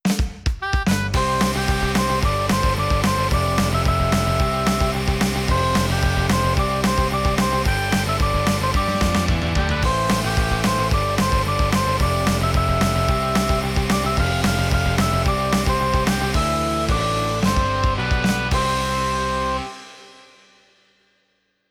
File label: BGM Karaoke Punk; tokimeki memorial girls side 4th heart; Scenario